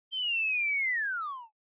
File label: Motion Falling Whistle Mid